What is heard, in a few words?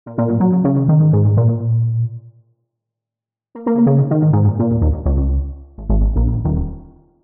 130 F# Intro noodle bass; Loops; Touch Loops; BlissedOutBeats